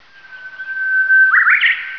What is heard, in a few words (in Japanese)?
uguisu, tori